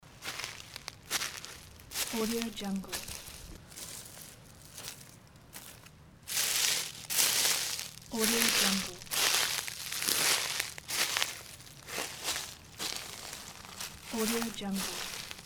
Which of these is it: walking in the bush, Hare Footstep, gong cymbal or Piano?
walking in the bush